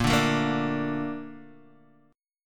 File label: A sharp Major 7th, Guitar Open D